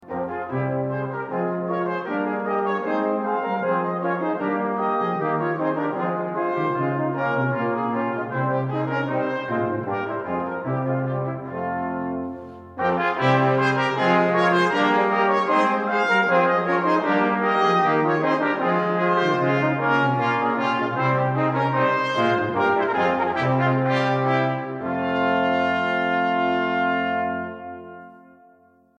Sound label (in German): altenglische tafelmusik; näglein Blumen